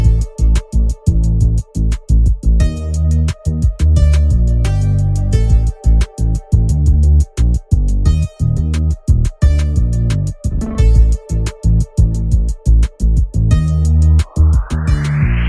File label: LG Q8 Ringtone